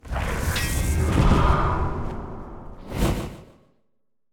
demon death, monster

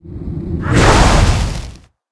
black ghost attack

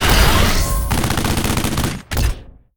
Boss MechNaga, monster

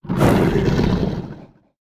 king lion main, monster

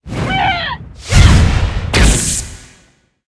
dark mage attack

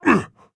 silverwood damage

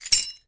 neck equip

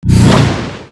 skill explo

